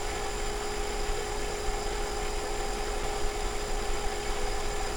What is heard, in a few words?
preheater, c172p; fgdata